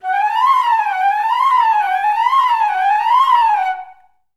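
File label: flute run up down C4; Best Service; Peter Siedlaczek's Advanced Orchestra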